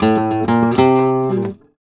Flamenco Guitar Remates De Pulgar, a

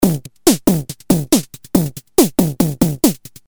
Loop, Drums; leloops

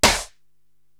SD Ambi Clapper, Korg TR-Rack drum machine

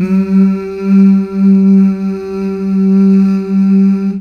Mmh Male, CH BACK; Best Service Gigapack 1

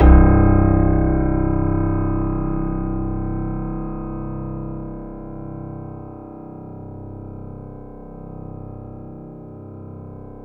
C2, Synth Line; 90 s Sample CDs; NiceBeats; SynthLine VOL-32

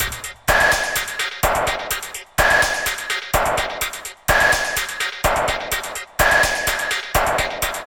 LOOP, Tekno Industrial; East West

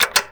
LEVER ON; AKAI Anime Sound FX

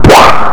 VOX FX; Big Beat Samples & More

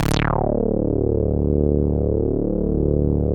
saw res dry, synth

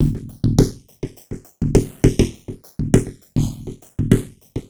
Drum Fx Loop, Electronica

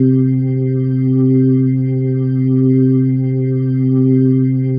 Single Note C2, Organ; Sonic Foundry (Sony Creative Software); Joe Vitale Organ Donor

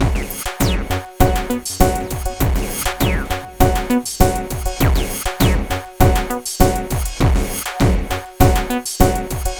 xtra tech beat; Sonic Foundry (Sony Creative Software); Ugly Remnants VOL-2

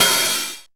HAT TRASH OPN, VINTAGE FUNK; Zero-G; Funky Elements

